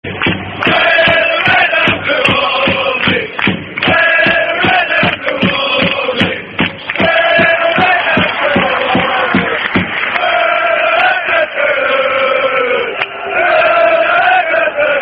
We're The Red And Blue Army Football Chant (Soccer Song); Melbourne MFC